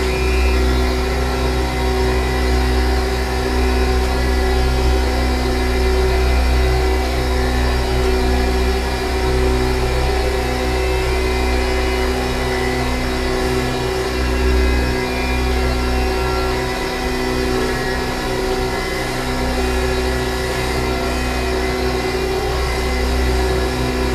Dome Panel Hum; Boomtown; Titanfall 2

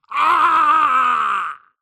grunt electro; Titanfall 2

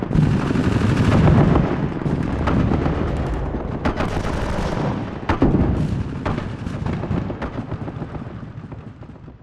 scripted distant fog battle; Titanfall 2

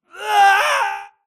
grunt death crush; Titanfall 2

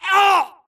grunt death neck; Titanfall 2

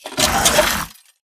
Mvmt Servo Walk; AI Zombie Stalker; Titanfall 2

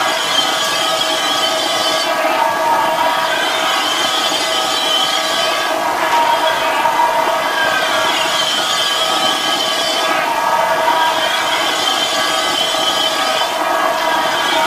Giant Pillar Pods Active Hum; Titanfall 2; Boomtown

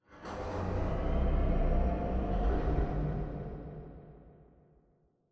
Robot Arm Angle Back Dist; Boomtown; Titanfall 2

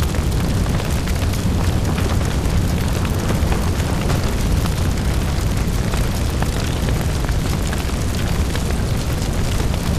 fire loop big crackle; colony; Titanfall 2

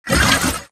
Mvmt Servo Walk; AI Stalker; Titanfall 2

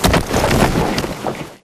Dish Bodyfall Player; Beacon; Titanfall 2

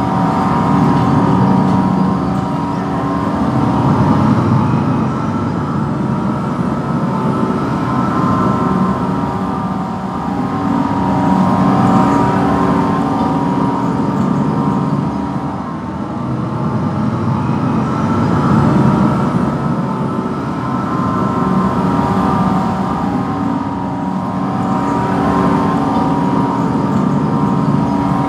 mechanical rotator servo close; Titanfall 2

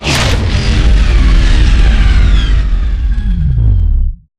dish power down; Titanfall 2

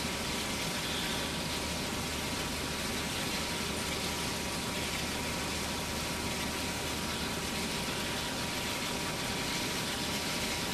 steam tiny; boneyard; Titanfall 2

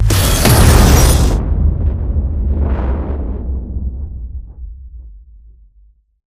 hotdrop to embark idle turbo upgraded; Titanfall 2

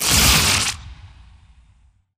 bullet energy titan arc; Titanfall 2

